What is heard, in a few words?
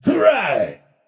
yay; smite; Ullr